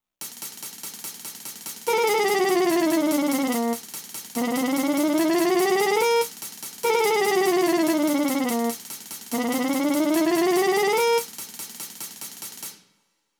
mechanical chromatic mono motif

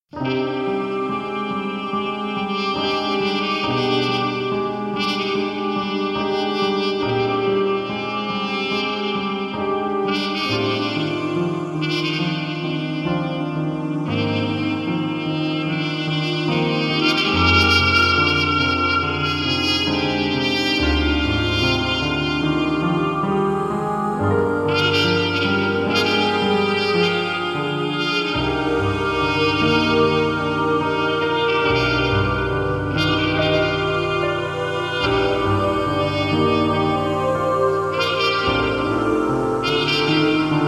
schilke 10a4a

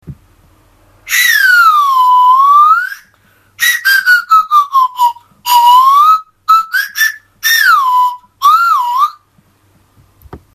straw fue

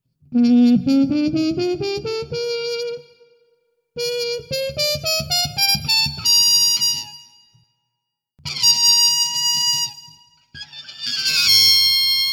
high tone

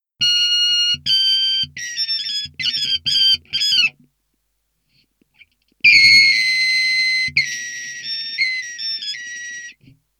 yamaha allen vizzuti high tone

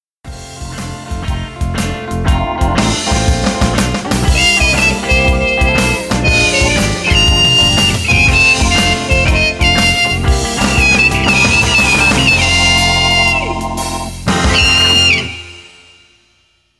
g blues

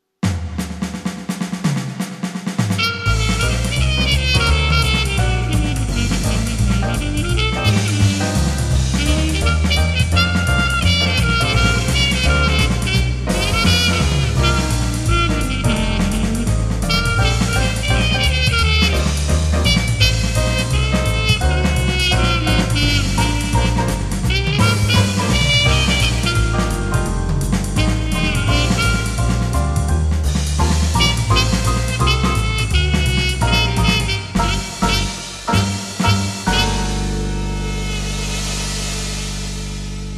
schilke 10A4a

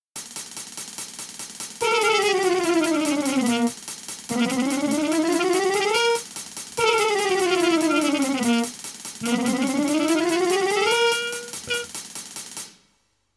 mechanical chromatic, mono

